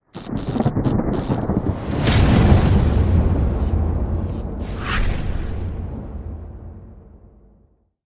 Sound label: lightning